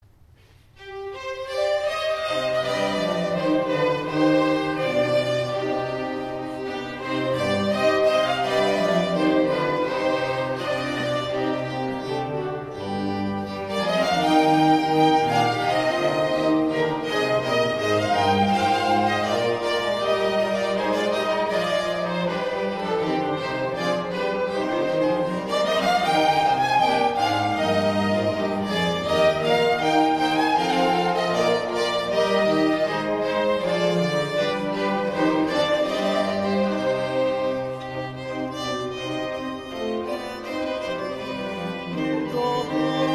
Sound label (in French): Messe Kyrie suite instrumentale; concert charpentier de doullens